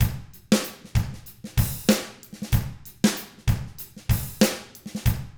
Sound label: 95 FUNK, Drums; ILIO; Double Platinum Drums 1